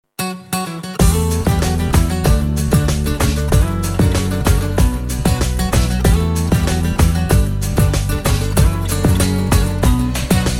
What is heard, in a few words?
Romantic